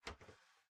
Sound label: Ladder Hand; Game rip; MiSide